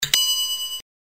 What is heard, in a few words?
Timer Ending; Seewo; EasiNote5